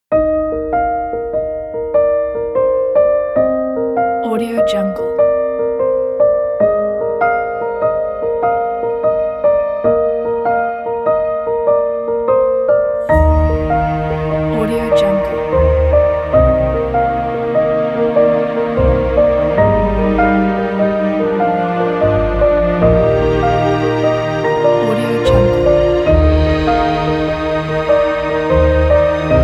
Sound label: inspiring uplifting emotional piano